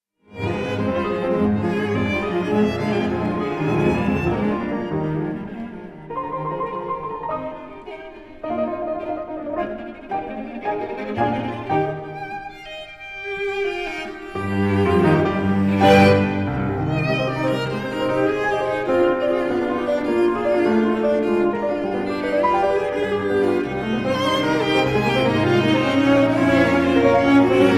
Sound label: quartet in B flat major for piano and strings 1st movement; op 41; allegretto